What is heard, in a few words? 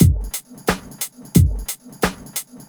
BEAT, funk; USB Soundscan vol.47; AKAI; Hip-Hop & R&B